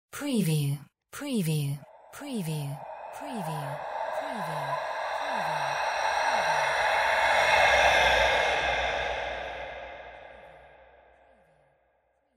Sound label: sci fi whispers spooky; windy beach audio